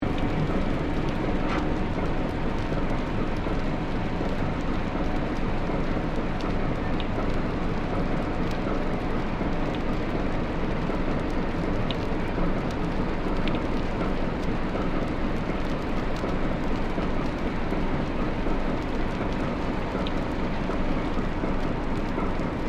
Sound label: BOAT FERRY FRESHWATER CLASS SYDNEY ENGINE IDLE SUBMERGED, Manly Ferry; Vehicles